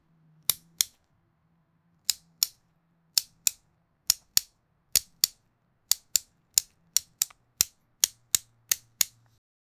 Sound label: plastic model of strawber